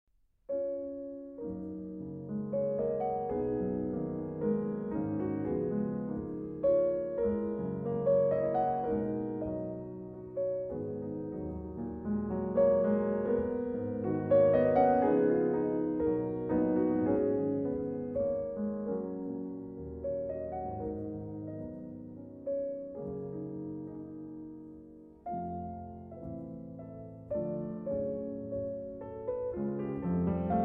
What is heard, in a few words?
song Lullaby